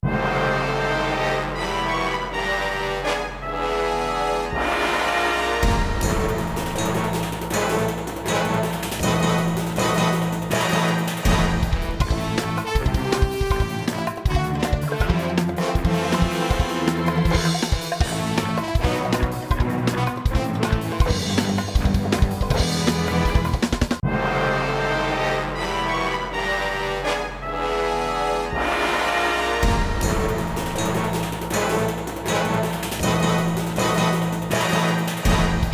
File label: Action START; bolt 2008 pc gamerip